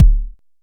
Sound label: analog quick, Kickbank; mpk mini presets; Ableton Foundation FreePacks